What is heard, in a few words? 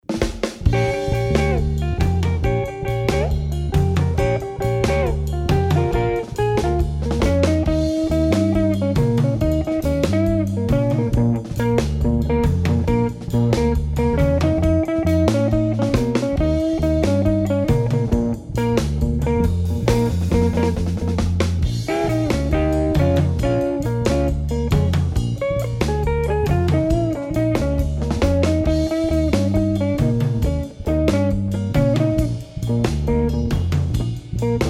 Rhumba Blues Drumming